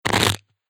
Crunchy Hit Impact; Miscellaneous Impact Sound Effects

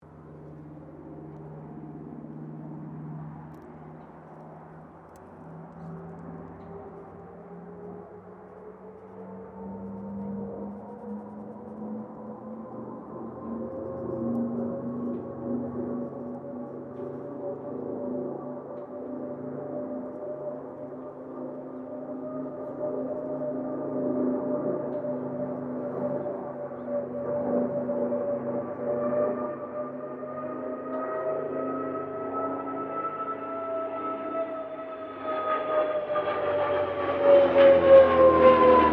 AIRCRAFT CA-13 BOOMERANG PASS STEREO

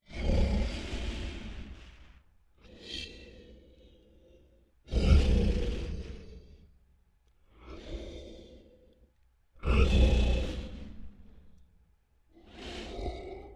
Creature Large Breaths Deep Resting; Scary Breathing; Big Room Sound

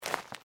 FOOTSTEPS SNEAKERS UNDERBRUSH STEP

